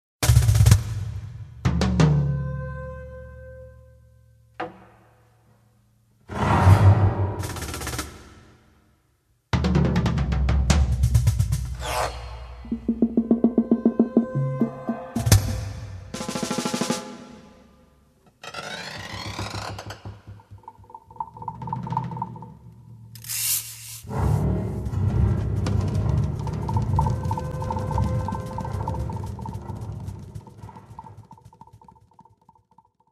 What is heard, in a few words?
Cajon